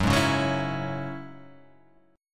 F sharp 7th Suspended 2nd F sharp down

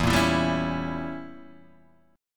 G Diminished 7th E, chord

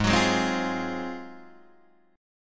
Ab 13th down Guitar Open D

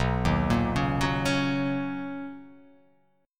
B Major 9th B, chord; BM9; arpeggiated; x,2,1,3,1,1,3,x

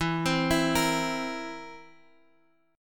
A sharp Suspended 2nd Flat 5th E

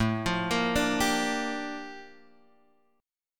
Bb Major 13th A

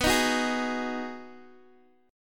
B 6th B down Guitar Open D

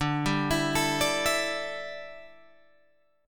D Suspended 2nd Suspended 4th, Guitar, Open G

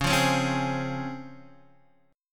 C sharp Major 7th C sharp Guitar DADGAD

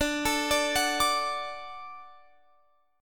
D-Major, D, Guitar, Open F; arpeggiated; JGuitar

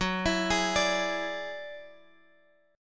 Gb 7th Suspended 2nd Sharp 5th, Guitar DADGAD; Gb7sus2#5 arpeggiated

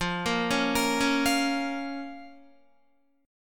F Suspended 4th Sharp 5th F down Guitar; arpeggiated; 4F,4A,5C,5G,5C,6E; JGuitar

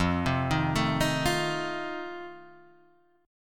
F 7th F down; F7 arpeggiated

